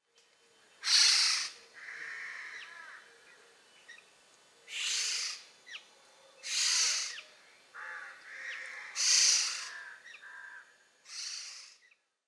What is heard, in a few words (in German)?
PIROL Warnen; vogelportraet; oriolus oriolus